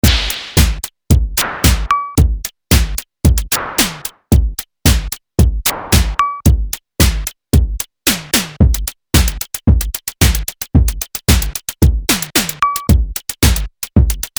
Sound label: Pattern Modular Kit, electronic music